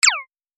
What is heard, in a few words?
pyun